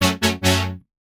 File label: Synth Shot F# Future; Trap and Dubstep; Synth One Shots; Ghosthack; Free Trap and Dubstep Samples 2018